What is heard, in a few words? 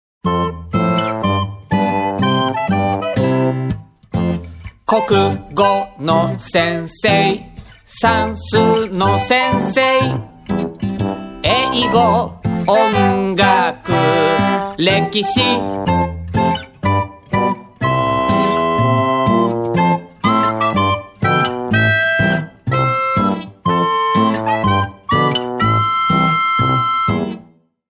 Recorder